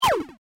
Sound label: bunny hole